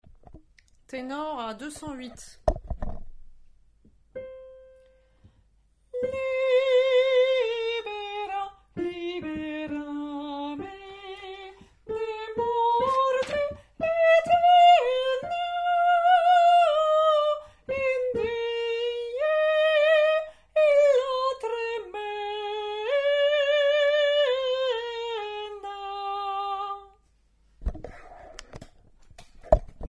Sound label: Tenor